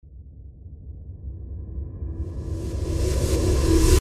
musical haunting

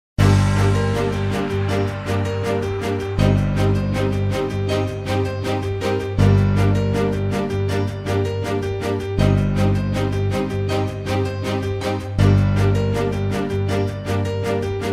Hip Hop

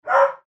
dog bark

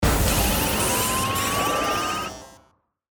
Burst Digital

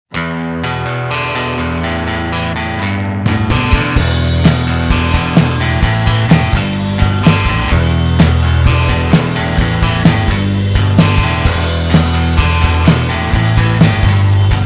Good Grunge; Soundzabound Volume 5

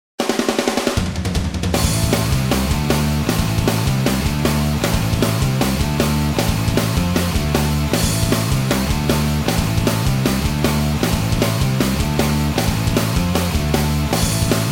Metal Machine

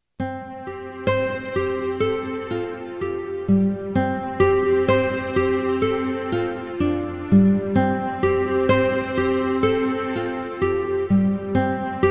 Ambient

